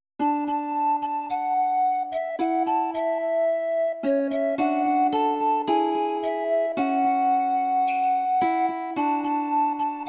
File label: spirit flute